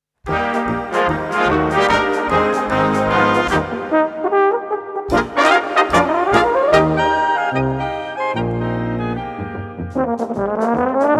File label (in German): tenorhorn